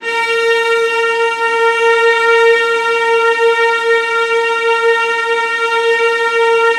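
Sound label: VIOLAS C5, Strings; ILIO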